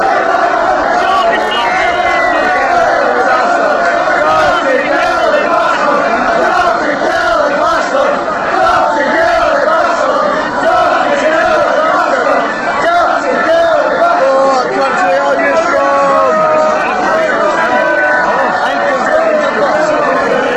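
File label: fanchants, football chants; dirty killie bds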